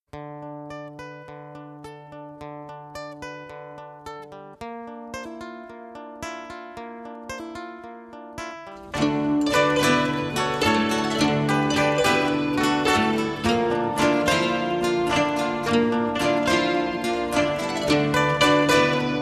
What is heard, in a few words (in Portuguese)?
Viola Chinesa